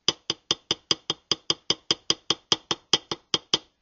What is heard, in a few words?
Plastic cup with homogenous cocoa